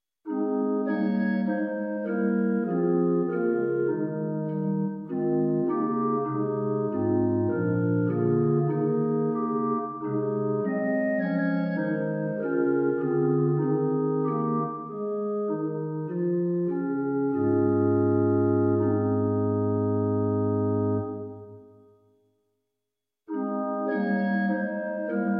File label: Stop Diap ET 4th Comma